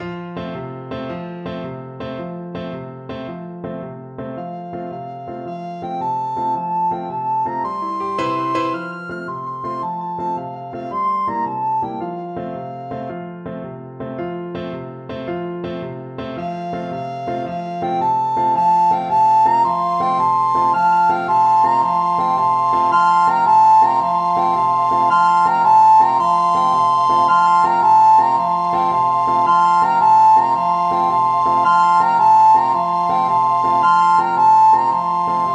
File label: recorder quartet